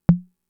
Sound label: Tom, Fricke MFB-522